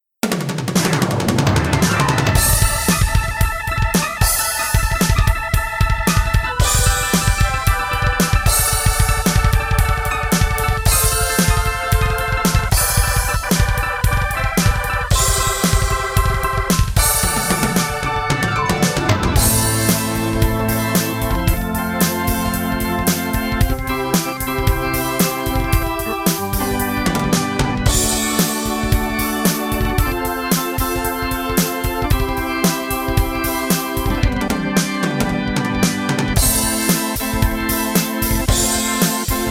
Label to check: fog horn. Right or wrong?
Wrong